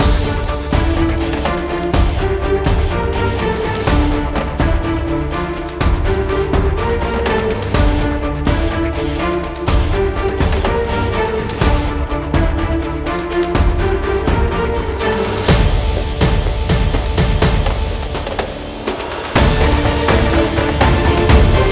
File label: tension warrior guerrilla guitar comp; gerilim; By Burak Sound Bank; tension vol 17